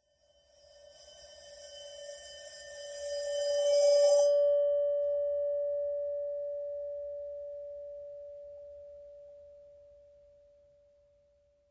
Cattle Bell Bowed, bells

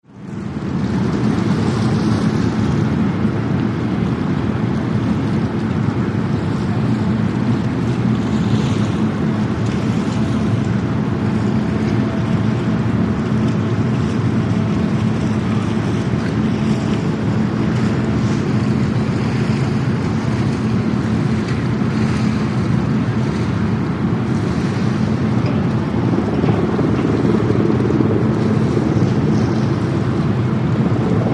Ocean Liner Int Rvrs B, ships

